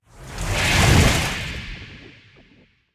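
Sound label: whirlpool deactivate; smite; poseidon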